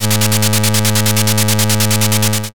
slot spin

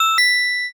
correct

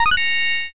join

